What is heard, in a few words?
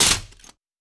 Glass